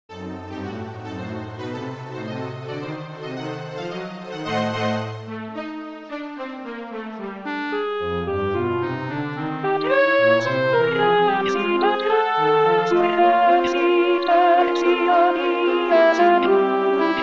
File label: Alto; Puccini; Messa; Credo; resurrexit